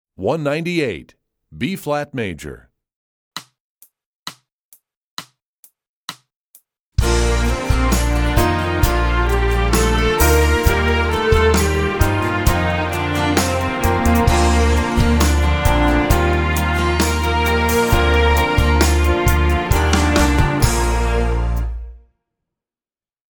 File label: B flat Major, Strings; EE2000 Book2